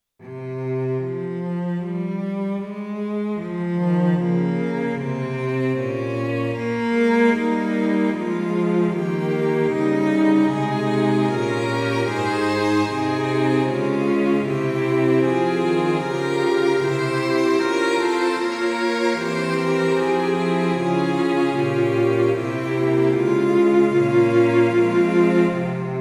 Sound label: Quarter note = 76, Amen, Tonality：f (♭♭♭♭); Tr Ob Cl Fg Str; Rc Rc Tr Fg Str; Rc Rc Tr Trb Str